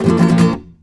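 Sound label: RASGAND B major, FLAMENCO Guitars; Spectrasonics; Hans Zimmer Guitars VOL-2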